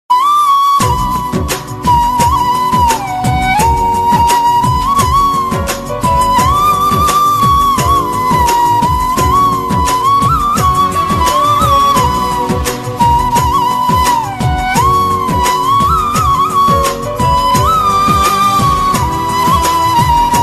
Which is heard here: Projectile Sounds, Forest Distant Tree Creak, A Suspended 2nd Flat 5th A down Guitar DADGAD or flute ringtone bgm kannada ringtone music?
flute ringtone bgm kannada ringtone music